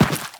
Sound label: Step Dirt, Footsteps; Warframe; Teshin